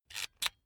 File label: electric drill template slide in medium; maschinen; bohrer; soundpool